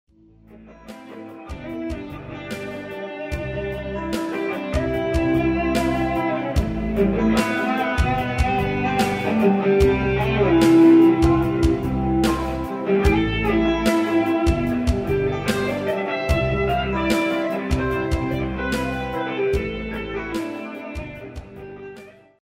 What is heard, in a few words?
simples guitar